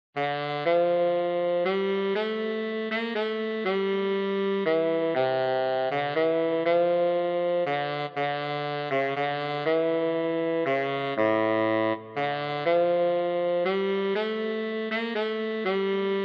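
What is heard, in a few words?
Greensleeves, Tenor Saxophone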